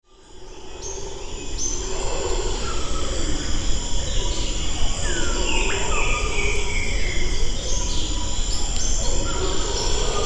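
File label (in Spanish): ambiente sonoro Estación Primatológica Colosó en Sucre bosque seco; 30seg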